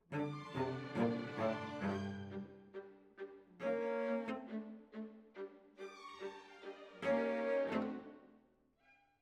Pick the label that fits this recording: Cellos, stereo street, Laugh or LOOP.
Cellos